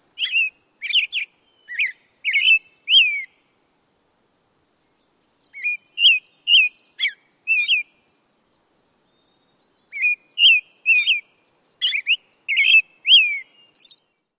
song

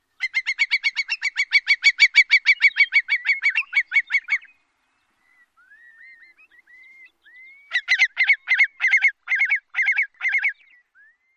more calls

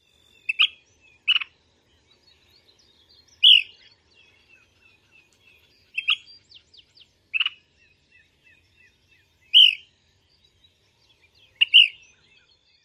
calls

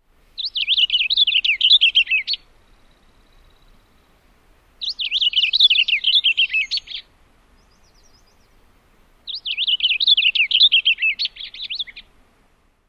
song

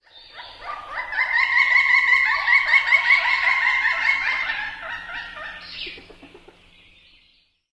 interaction calls

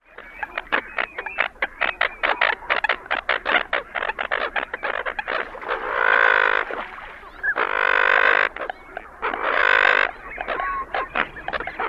call variant

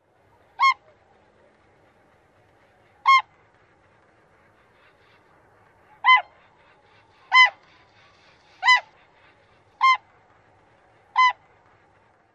flight calls

